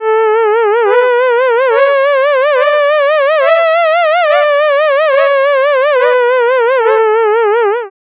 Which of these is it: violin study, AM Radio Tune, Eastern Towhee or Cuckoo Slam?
violin study